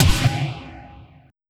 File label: Trap Oneshot; Sonic Foundry (Sony Creative Software); Esoterik Beatz